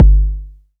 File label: Kick Big Sine